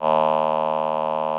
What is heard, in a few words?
VP-330, String Machines